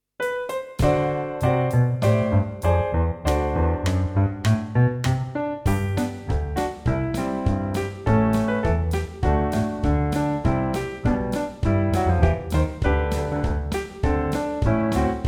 Opposition Arms, preliminary tap

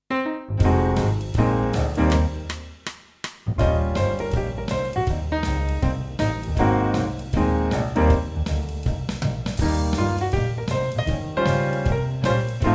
Rhythm; Grade 4 Tap

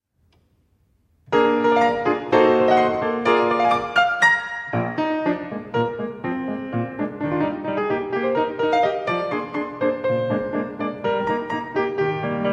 Pirouettes En Diogonale, ballet; adv 1 ballet

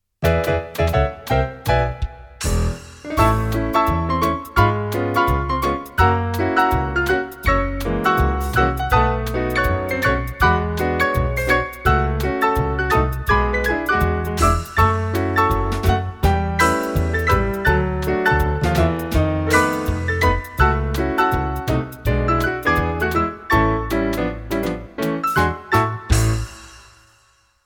Rhythm Charleston 16 Bars, tap; adv 1 tap

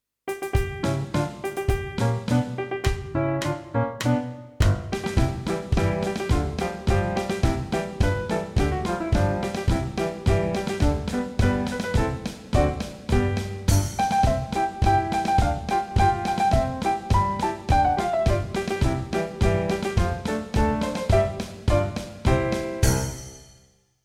Rhythm, Class Tap

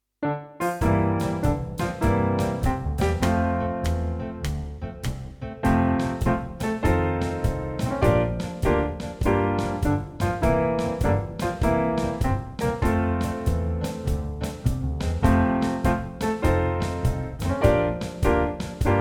Class Dance 6 8, class tap

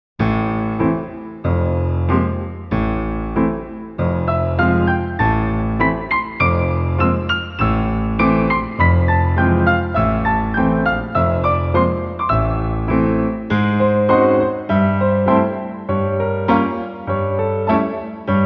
Grand Battement; Adv 2 Ballet

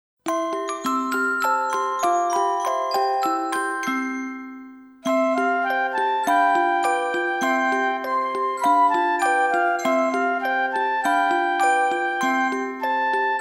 Runs, class ballet

